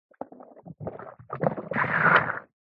cartoon watery squelch poop diarrhea rumbling stomach; zapsplat